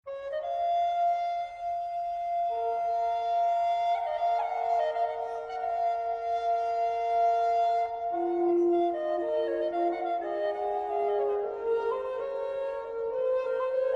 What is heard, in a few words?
recorder organetto